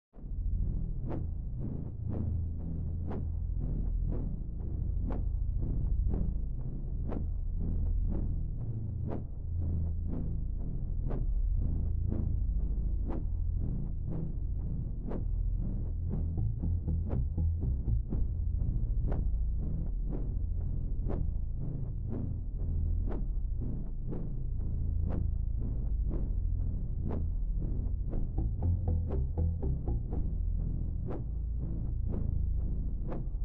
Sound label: Bass I; risk of rain 2 engineer edition 2